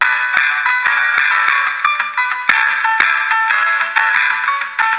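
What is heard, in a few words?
bamba, horn; model 336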